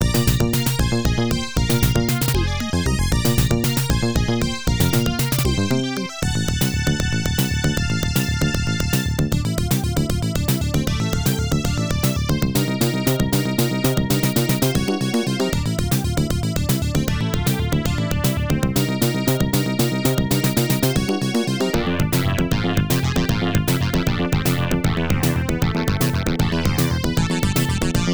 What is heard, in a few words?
Stage 1 BGM; Power of Anger